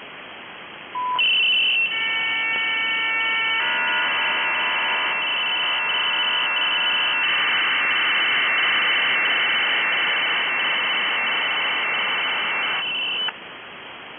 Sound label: Station 4XZ sending serial tone data with activated DCS signal; Tadiran; DIGITAL-CODED SQUELCH; Israeli